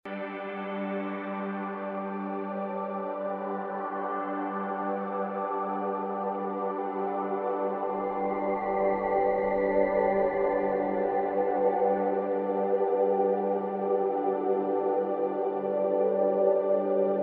Light, Warm Atmospheric Blend, Ambient SoundScapes